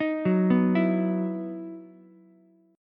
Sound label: E Major 7th Suspended 2nd E down Ukulele; arpeggiated; JGuitar